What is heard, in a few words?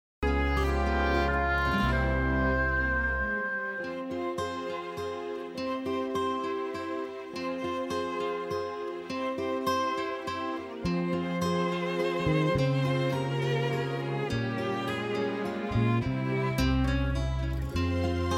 Backing Track